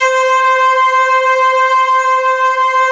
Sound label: ST-ORG, STRINGORGAN, Yamaha VP-1